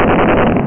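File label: machine gun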